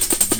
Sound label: beat rhythm, break beats